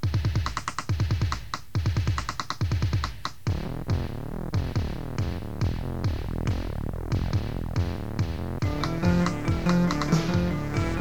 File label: funk